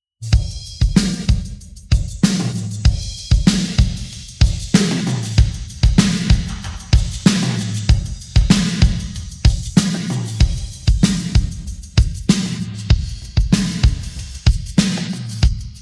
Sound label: pattern, drums